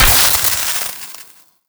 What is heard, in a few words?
spark sparkles